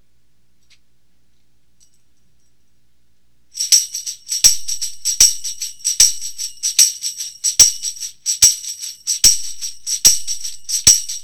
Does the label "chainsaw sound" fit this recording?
No